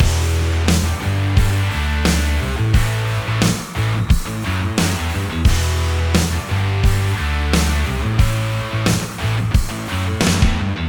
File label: Stadium Rock